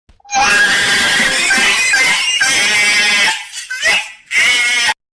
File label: goofy laugh very weird